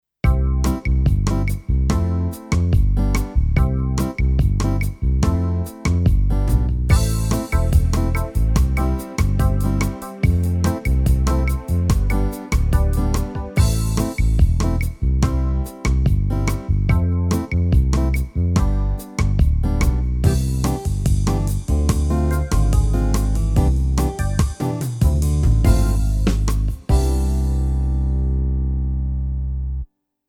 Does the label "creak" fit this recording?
No